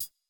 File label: hihat